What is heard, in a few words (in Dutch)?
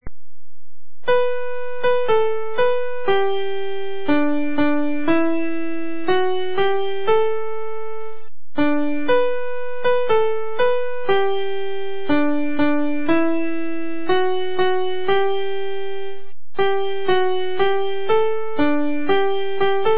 liedje piano